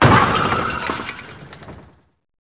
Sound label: Crash